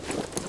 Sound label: tac gear, gear rustle; Gear Rustle Redone